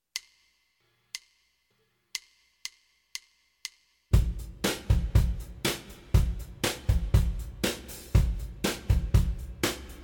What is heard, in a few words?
country, 120 bpm, drum tracks